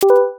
soft ui confirm; buttons ui

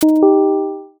stream donation soft

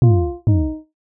warning card pulse; buttons ui

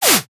whip whoosh

